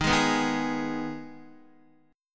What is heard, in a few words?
Eb Suspended 4th Sharp 5th Eb Guitar 4E 4A 5D 5G 5C 6F; Ebsus4#5 strummed; JGuitar